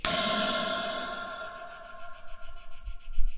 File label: Metal